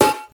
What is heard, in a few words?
airy snare drum g sharp key